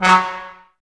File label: brass, Tbs Mute; 90 s Sample CDs; Miroslav Vitous; Woodwind Brass Ensemble